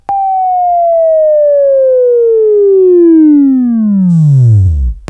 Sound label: Buzz; Labview-Program; Sweeping Training program